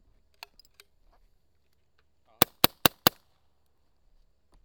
automag dry; slightclip; automag rt

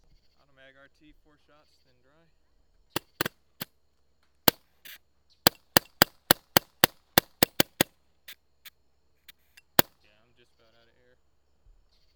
automag raw; guns; automag rt

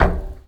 step metal, footsteps

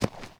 step grass, footsteps

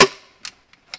step mixed, guns

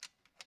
step recock, guns

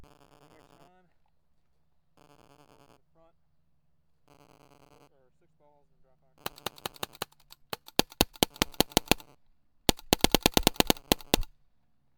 autococker raw not clipped, guns

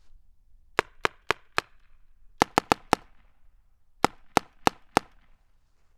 spyder long barrel front, guns; spyder se

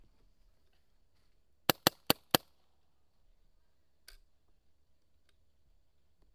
automag dry, guns; automag rt

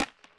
empty shotgun, guns; trracer

